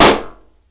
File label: POP; Princess & Conquest v0.20.06